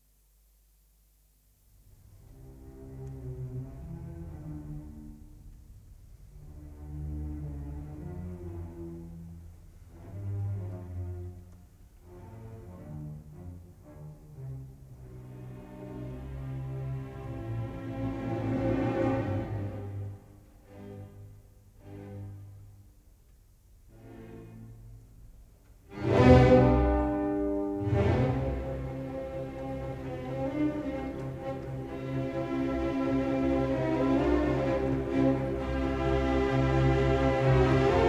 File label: Triple Concerto for Violin, Cello and Piano in C Major; Ludwig van Beethoven; Op. 56; I. Allegro